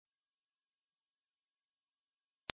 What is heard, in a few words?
Mosquito